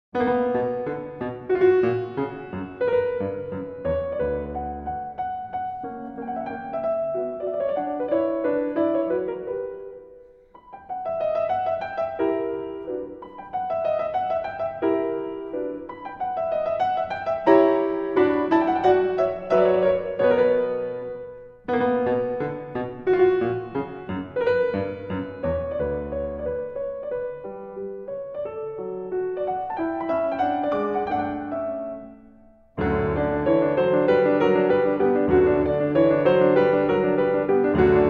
Keyboard Sonata No. 47 in B Minor I. Allegro Moderato; Hob. XVI32